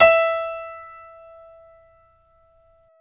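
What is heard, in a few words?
piano, Grand piano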